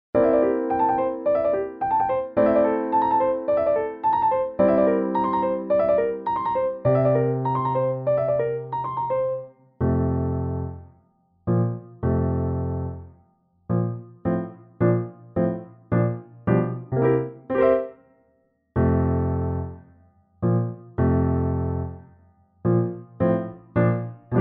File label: piano sample; Debussy